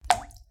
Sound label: Water bloop